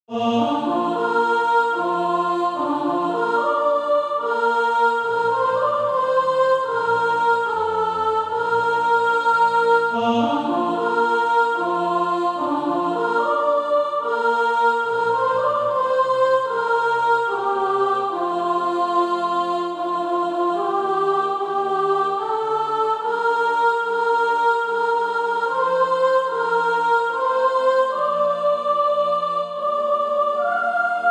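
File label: soprano, Practise Music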